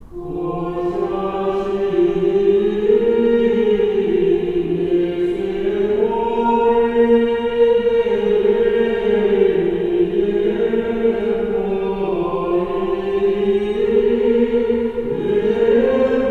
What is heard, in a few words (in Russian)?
Цветная неделя 1 песнь 4 глас